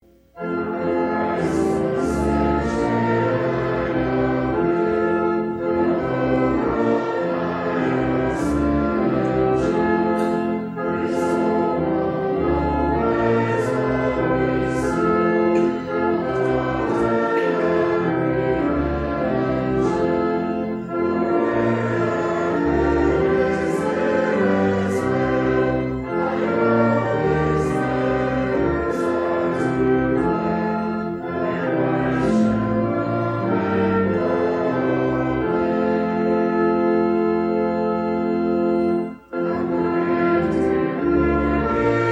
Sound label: trumpet